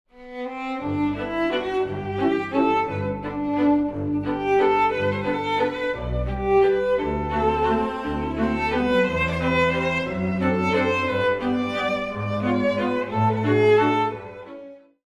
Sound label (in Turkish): santral fon muzikleri